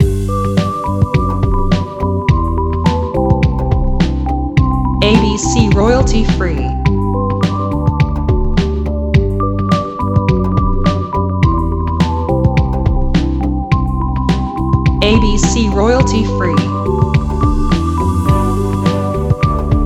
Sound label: Commercial Background loop no Guitar